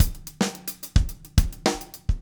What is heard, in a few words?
CONGA